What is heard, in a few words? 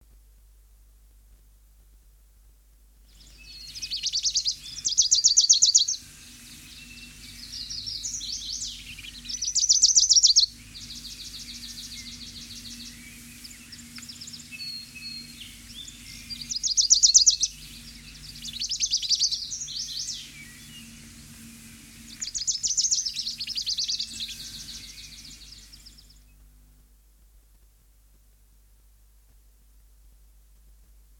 wilsons warbler, songbirds